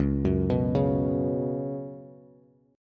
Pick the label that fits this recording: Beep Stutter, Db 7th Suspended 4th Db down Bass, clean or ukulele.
Db 7th Suspended 4th Db down Bass